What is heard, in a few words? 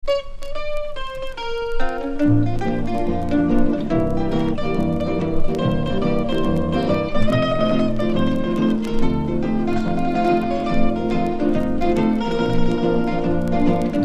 mandolin slack; kihoalu